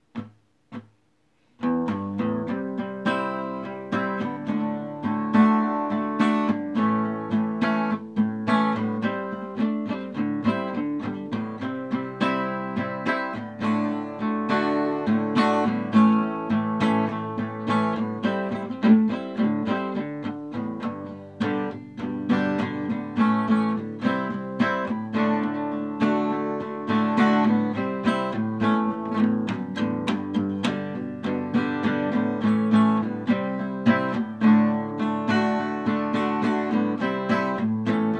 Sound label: acoustic; panther tracks cafe